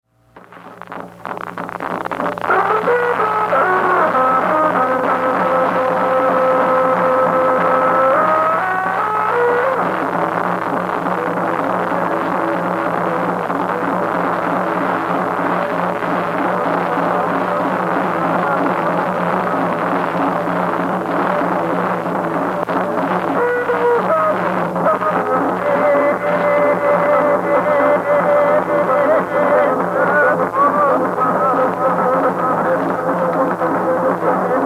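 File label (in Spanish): Canto de hombre con trompe, Mapuche; Música Mapuche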